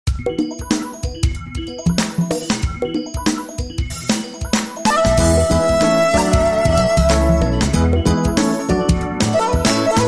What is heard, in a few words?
Jazz Tech